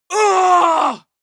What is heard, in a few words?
male combat hit hard; soundpool